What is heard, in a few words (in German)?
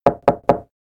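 klingelton klopfen tür anklopfen klopf klopf knock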